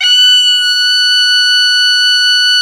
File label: MIZMAR F#5